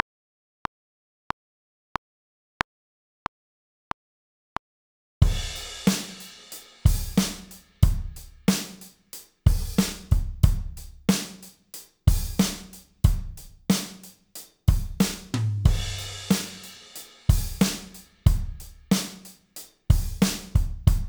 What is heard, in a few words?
drum